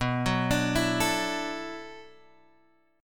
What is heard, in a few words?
B Minor 11th B down Guitar Open G